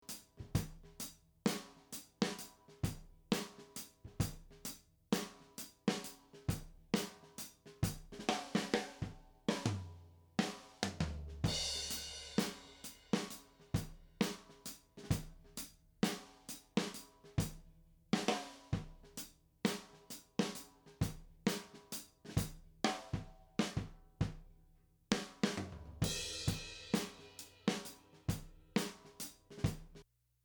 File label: lofi ovr only